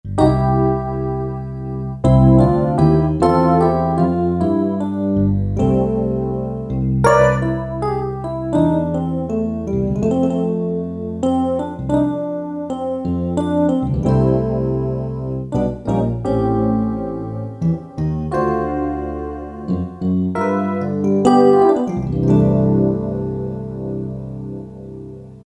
PCM E-Piano, Roland D-50; Factory preset demos

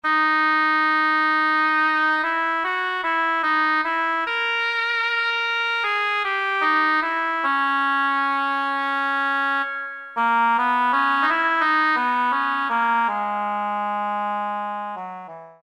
Oboe, Yamaha TG33; Factory Presets

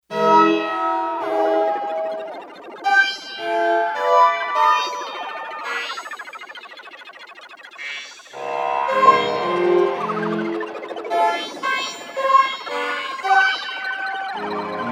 Prepared Six, synth

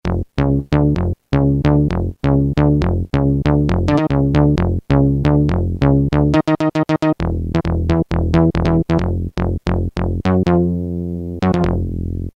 Coar Bass; Roland M-DC1

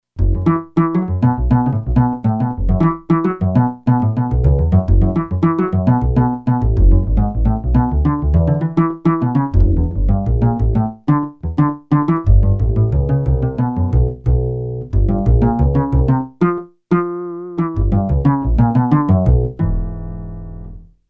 Upright Bass song

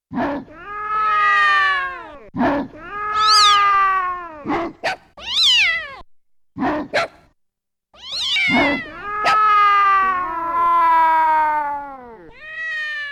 Dog VS Cat; Yamaha TX16W

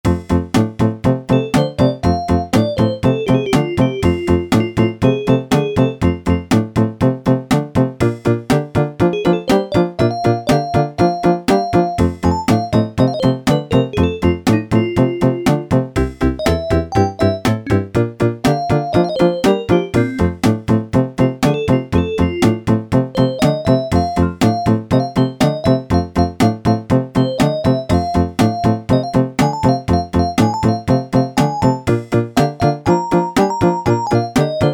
ROCK'N'ROLL, Yamaha SHS-10; Factory style demos

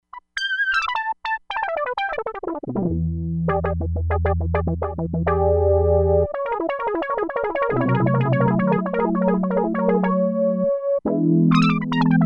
LEAD SYNTH, Crumar Bit 99; Factory Programs

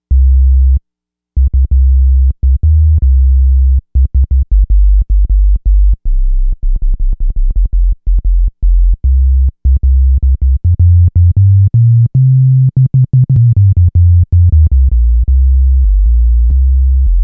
Kick n RNB Sub, Novation UltraNova; Factory Patches

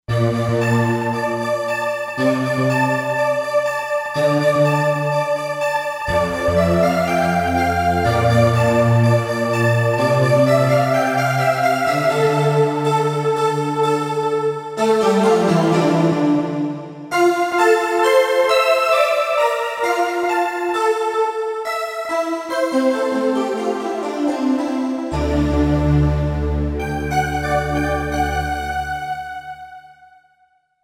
Roland SC-880; Factory Patches; Fantasia 880

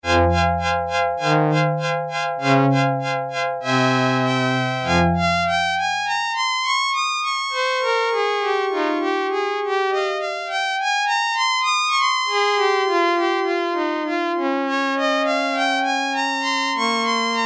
STRINGS 3, Yamaha DX7

